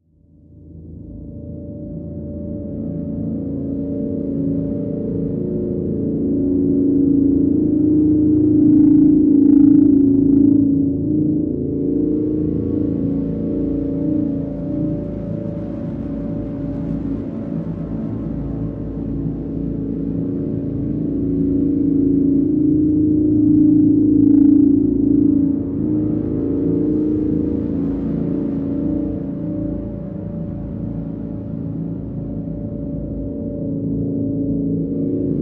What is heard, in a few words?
Dormant; Drn Dormant A